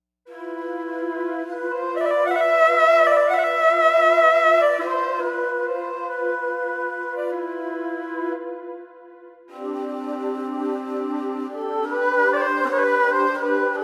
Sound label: china wide; Jupiter-80